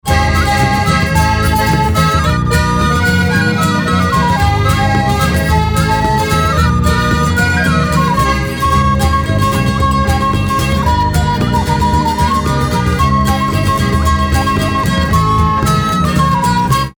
Trad. Folk

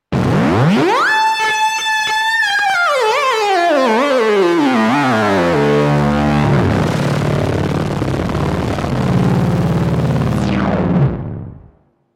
Fat Porta Lead

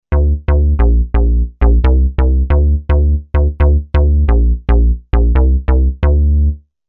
Odyssey Bass

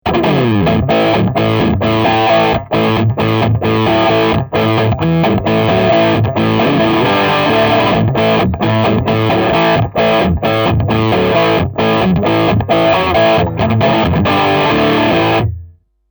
Riff n Muffle

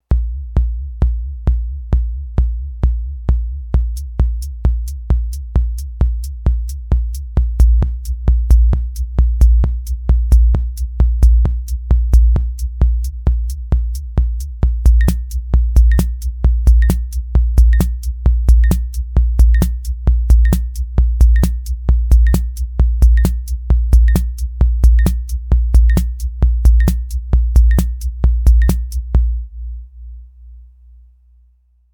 MFB Tanzbär Lite Dance Bass

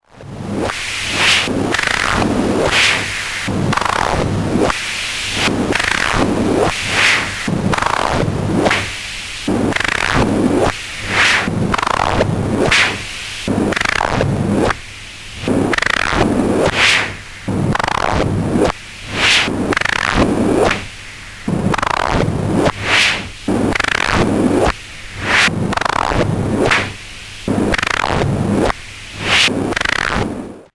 Filter M12; Arturia

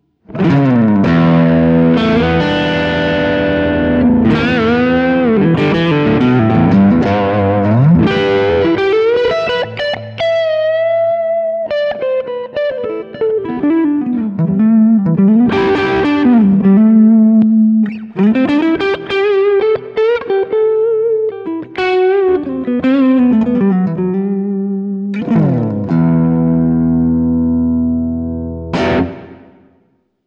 Ibanez RG631ALF Neck Pickup Crunch

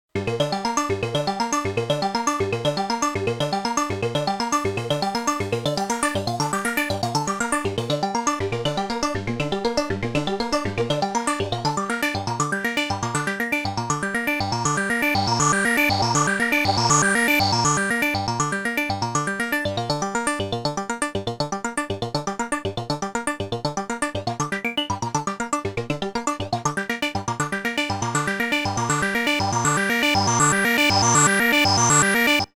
Hydrasynth; punjab

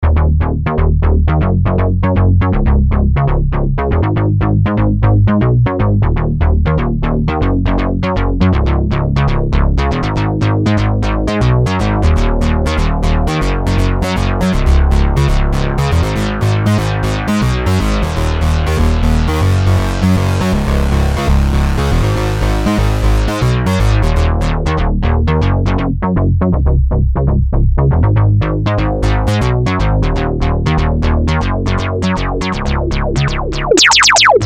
SX240 modulations

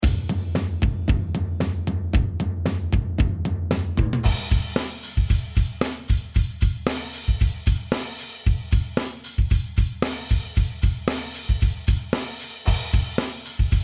drums processed

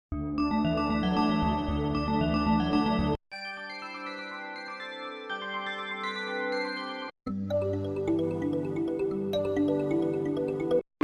Bells

